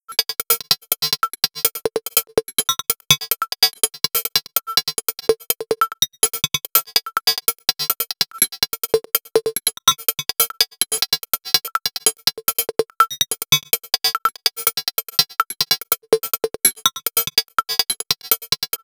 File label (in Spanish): percu